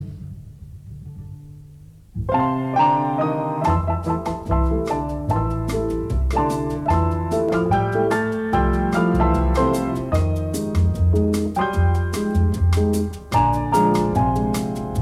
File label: Piano Bossa Nova